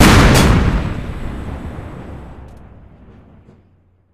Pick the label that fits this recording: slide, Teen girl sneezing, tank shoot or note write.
tank shoot